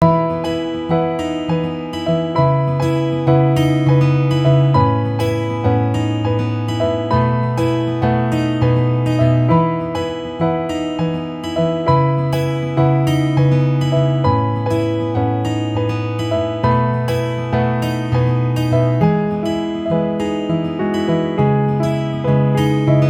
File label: Delightful Guitar Piano